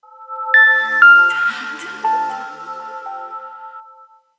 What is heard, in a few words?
Power off, phone; Samsung SGH-T369 Linx